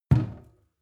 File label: sink pull plug no water